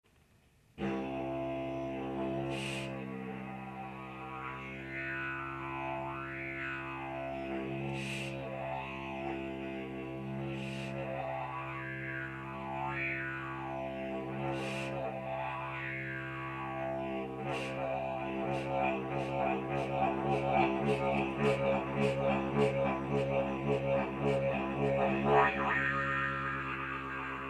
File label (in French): La respiration circulaire, didgeridoo; ou l'art et la manière de jouer pendant des heures; Alors, pour la respiration circulaire (ou l'art et la manière de jouer pendant des heures)